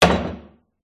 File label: pub wooden bar stool set down on wood surface; field reports